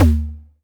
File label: Tom, Percussion; Vengeance Samples